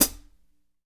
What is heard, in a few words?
HH PD R, Drum 1 Dry; East Collexion